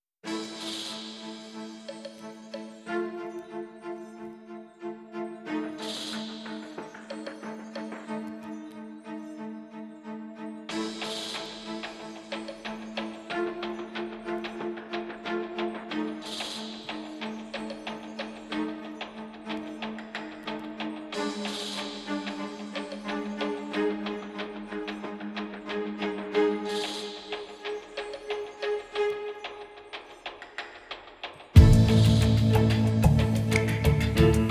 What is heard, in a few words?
staccato, soundtrack